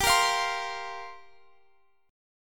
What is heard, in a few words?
G Minor 11th G down Guitar Open F; Gm11 strummed